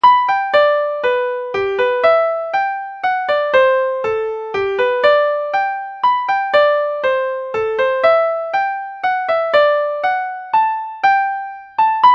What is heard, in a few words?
mazurka; kilcar